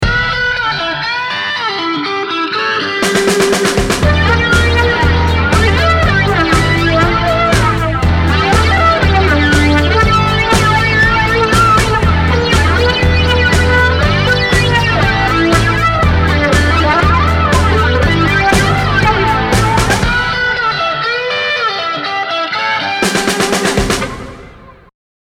flanged

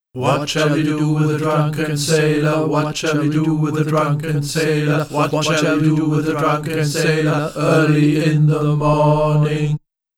chaos sailor fade

